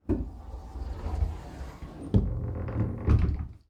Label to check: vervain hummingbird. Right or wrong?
Wrong